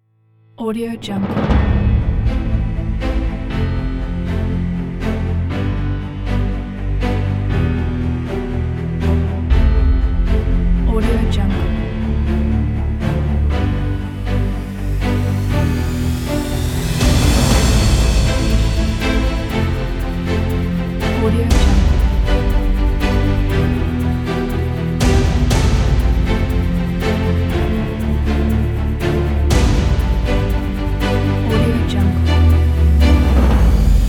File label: epic motivation